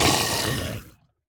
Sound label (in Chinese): 死亡声; 尸壳